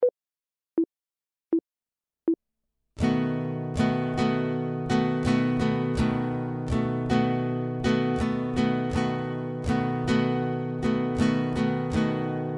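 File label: chord, guitar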